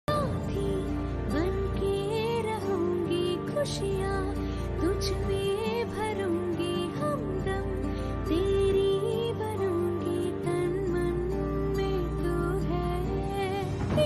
movie romantic ringtone tamil movie love song hindi love ringtone; mr pregnant